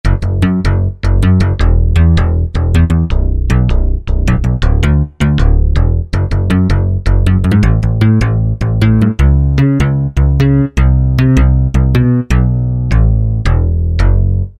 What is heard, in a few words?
Guppy, Yamaha TG500